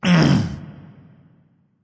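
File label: nonverbal clear throat; smite; voicepack; ullr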